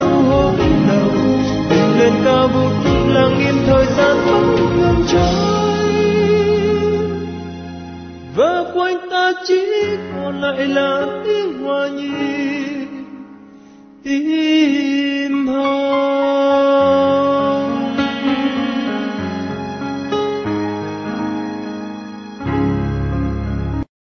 cool vietnamese love ringtone

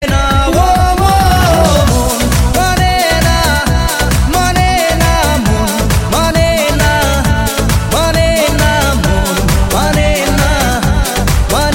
Bengali romantic ringtone

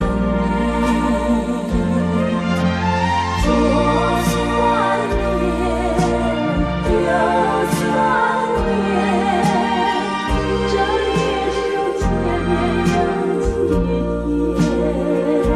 acute taiwanese ringtone

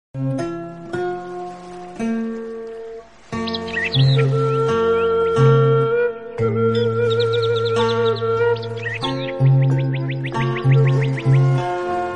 my flute

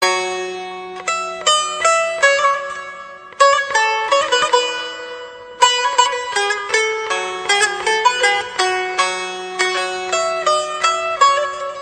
turkish old gold ringtone

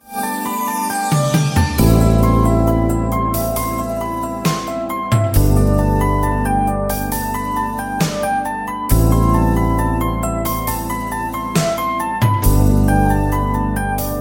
fantasy angel ringtone

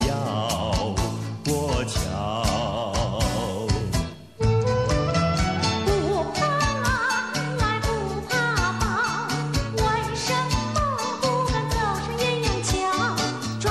from taiwan ringtone

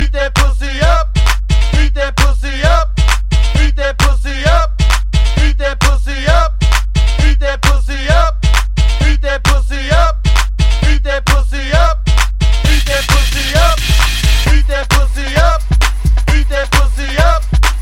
beat up; english

